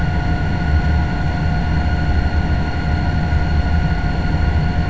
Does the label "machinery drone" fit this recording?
Yes